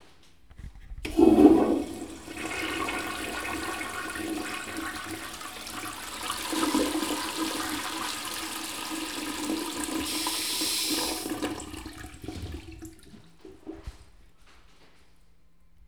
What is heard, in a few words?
Toilet